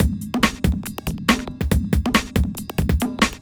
ind 140 kit

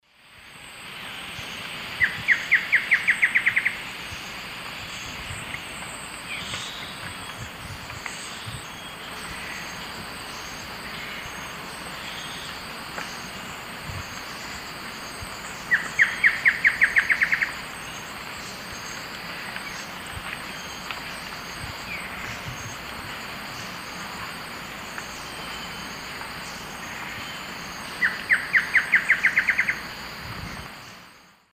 Herpsilochmus dugandi, song; S. America; Eastern Lowlands

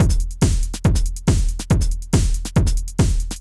techno 140

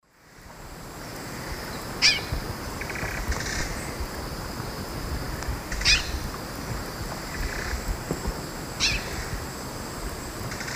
Paroaria gularis, calls; S. America; Eastern Lowlands

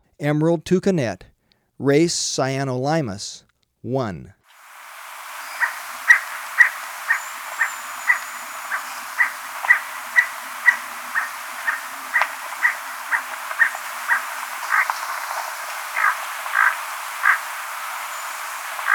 Emerald Toucanet, Birds of Ecuador; S. America

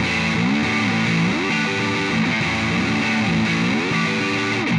Pushing Riff, Rock Guitars

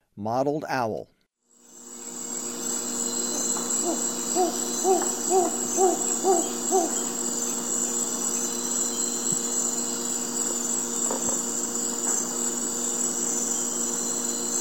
Mottled Owl; S. America; Eastern Lowlands

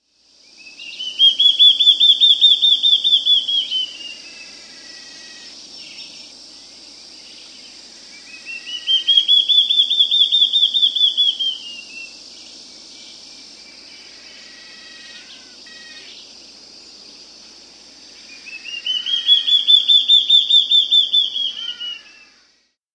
Large-Tailed Antshrike; S. America

